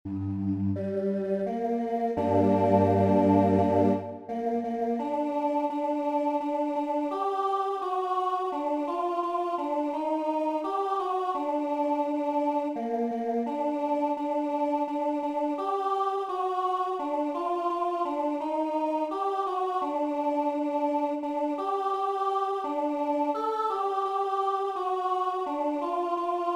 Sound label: Altos; look ye saints the sight is glorious